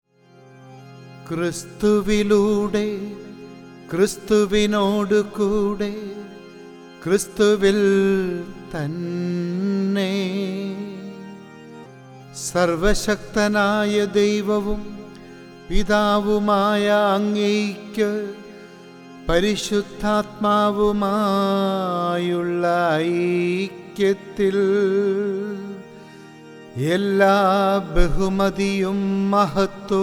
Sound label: Chant; Kristhuvilode; ordo cantus